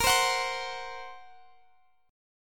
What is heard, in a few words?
A sharp Suspended 2nd Sharp 5th A sharp down Guitar Standard; A#sus2#5 strummed